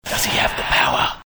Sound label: whisper